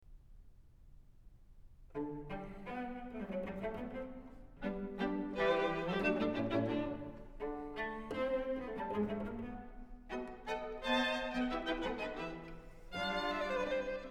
String Quartet No. 2 in G Major, Allegro molto, quasi Presto; Ludwig van Beethoven; Beethoven - String Quartets No. 1-6, Op. 18